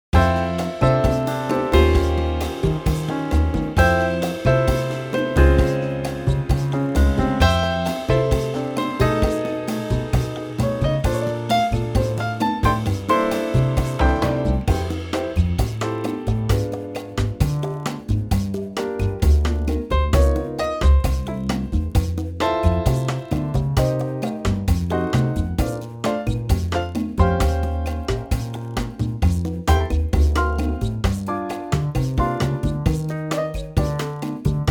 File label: F Latin